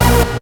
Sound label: DIRT HIT, STAB; SoundFactory; SMPMANIA